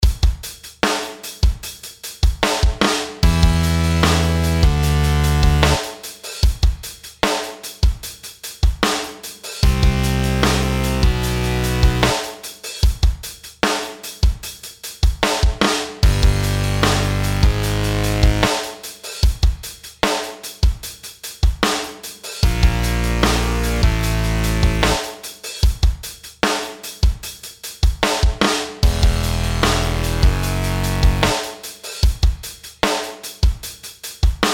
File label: intervals